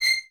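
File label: Violins C 7 staccato right; Miroslav Vitous; String Ensembles; 11 Violins; 11 VS Stacc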